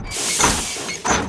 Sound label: Steam Walker Walk